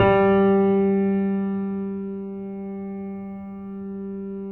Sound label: PED MF, GRAND PED; Best Service Advanced Media Trax Modern Composer